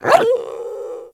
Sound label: Puppy Idle Growl Playful; Warframe; Kubrow